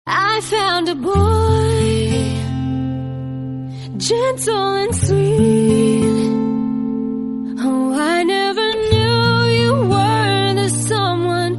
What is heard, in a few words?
lovely English song tone ringtone; english ringtones